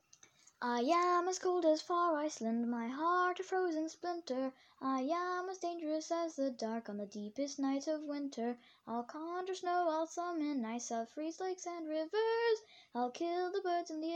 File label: ballad